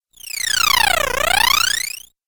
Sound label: Cool Fx Video Game